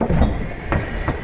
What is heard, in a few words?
MACHINE Press Machine Slow; loop mono; MACHINES & MECHANICS; Universal Sound FX